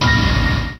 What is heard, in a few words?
scare Chord; butterflysoup2